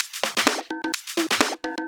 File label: Electro Loop 128 BPM; Vengeance Samples